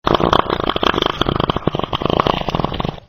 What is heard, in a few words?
elderly miku fart